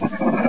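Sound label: scuttle; duke nukem total meltdown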